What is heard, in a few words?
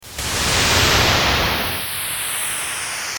High Pass